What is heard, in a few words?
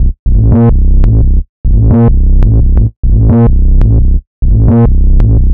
Convoy Bass, Drum n Bass; KJ Sawka; Drum n Bass & Beyond